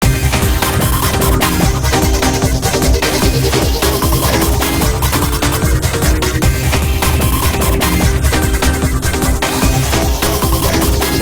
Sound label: cardiogram intense; yttd